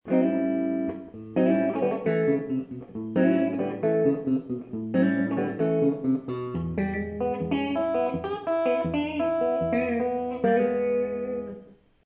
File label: EG1 middle, guitars; Vintage P-90 Middle; white EG1 middle